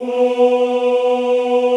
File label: OOH B2, Classical Choir; Best Service; Extended Classical Choir